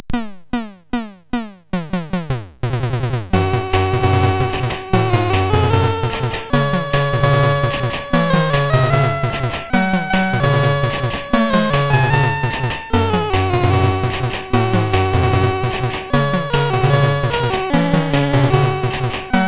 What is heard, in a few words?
Death March, MOS6581R4; Death March II